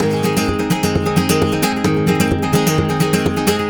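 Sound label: 130 G# rumba major, Spanish And Gypsy Traditions; Soundscan vol.59; AKAI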